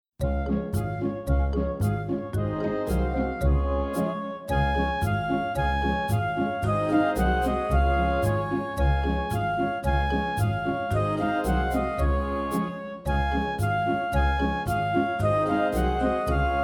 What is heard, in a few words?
Melody